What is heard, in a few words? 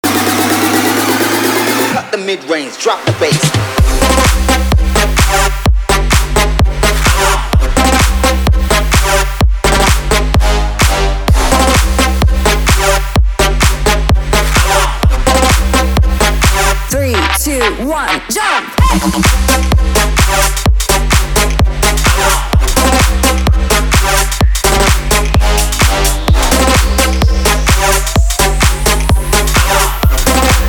drop that bass